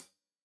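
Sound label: hat closed, hi-hat cymbals stereo; Deeply Sampled Drums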